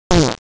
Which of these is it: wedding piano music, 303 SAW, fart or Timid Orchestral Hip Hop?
fart